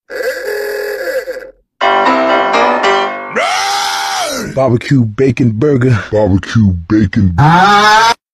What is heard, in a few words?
goofy ahh ringtone